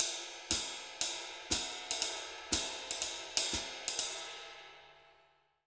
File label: RID, Jazzistic, 120; Univers Sons